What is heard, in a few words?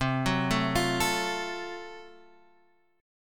F sharp Minor Major 7th Flat 5th C